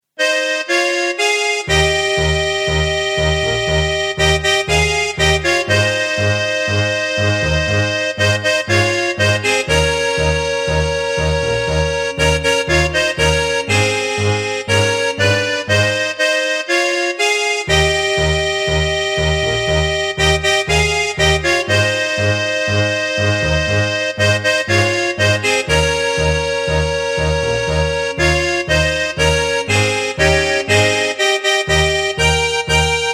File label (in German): Tango